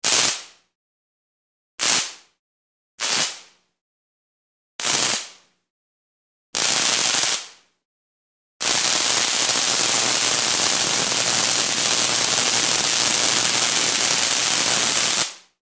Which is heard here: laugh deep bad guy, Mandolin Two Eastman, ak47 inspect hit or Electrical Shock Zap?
Electrical Shock Zap